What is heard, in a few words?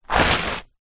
foley footstep sneaker scuff movement dirt